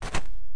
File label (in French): pas hom terre; WehikulCzasu; Time Machine; Bruitages